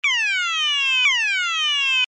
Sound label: alarm tone, sounder alarm tone; sounder beacon